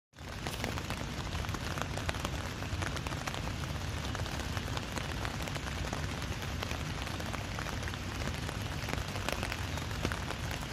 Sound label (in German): Regen im Zelt